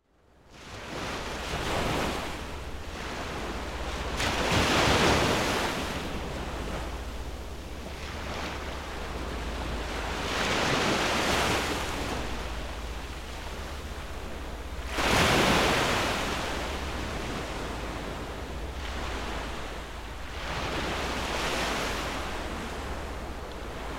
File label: Waves, Lost at sea